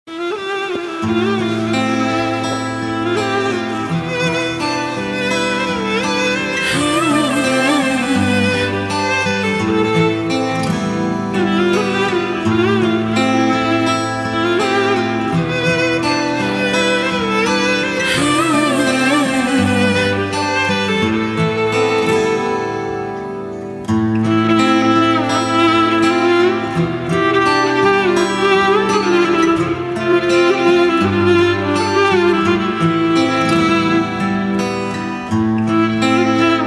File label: Keman & Guitar Version